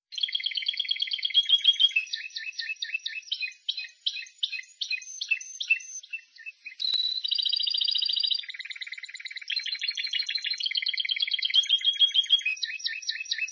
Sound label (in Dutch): timbrado